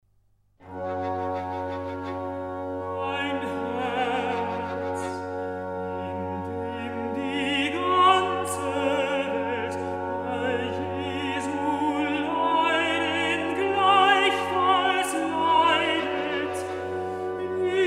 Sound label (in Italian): Recitativo; Passione secondo Giovanni